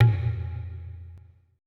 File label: E LOG G, ETH DRUMS